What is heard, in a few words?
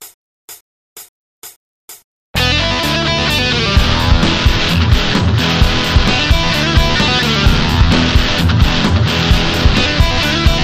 blues